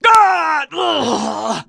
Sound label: death